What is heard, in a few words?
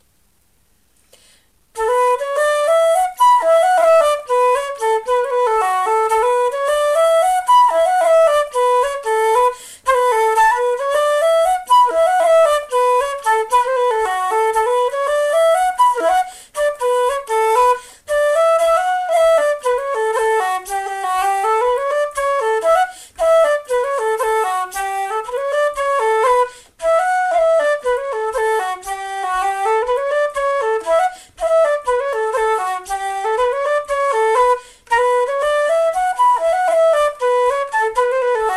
polka slow; Prelude Polka